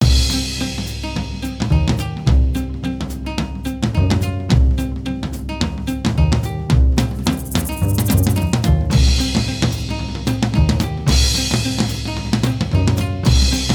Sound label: Menu Music Loop; HoneycombRush